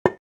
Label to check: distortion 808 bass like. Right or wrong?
Wrong